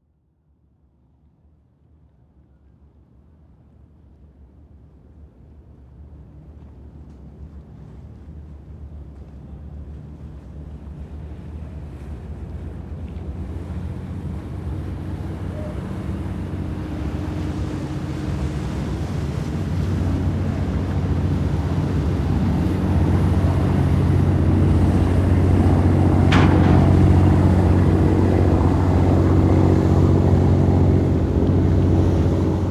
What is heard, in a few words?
hangar ships passby, ambient; War Thunder